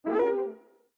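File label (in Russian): ошибка Windows Error; zvuki dlya windows; zvuk oshibki windows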